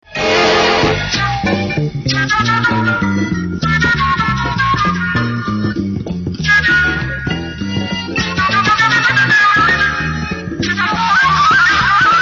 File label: flute spinning; エキサイティング・フルート